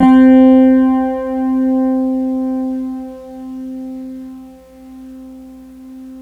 Chapman stick lick; Greytsounds

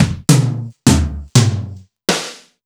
Simmons SDS2000

